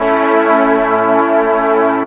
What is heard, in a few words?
DR. LOOP; X-Static Goldmine 3